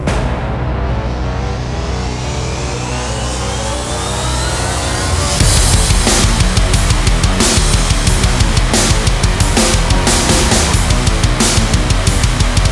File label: Epic Rock Metal Montage 90bpm C; A Legend of Luca